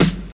Subby Snare Sample F# Key, snare drum tuned to the F# note